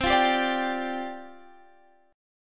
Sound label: C Suspended 2nd Suspended 4th C Guitar Standard; Csus2sus4 strummed; x,15,15,12,15,15; JGuitar